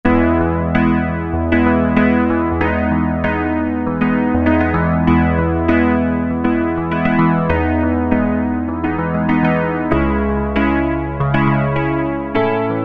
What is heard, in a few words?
E Piano; Factory A